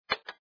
塑料音

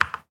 麻将音效

